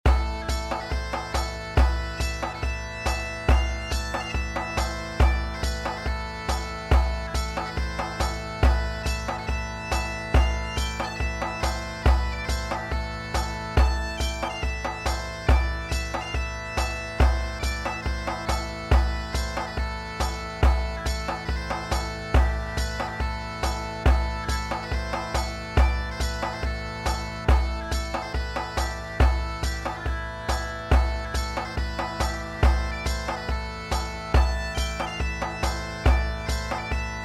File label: bagpipe